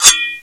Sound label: SWORD